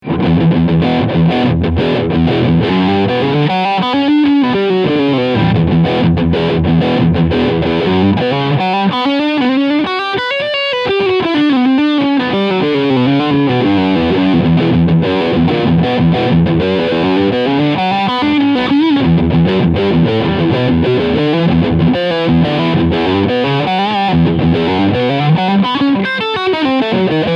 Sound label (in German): Leadsound Steg Humbucker